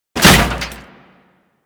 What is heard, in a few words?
Restrained Foley; Warframe; Solaris; Solaris Worker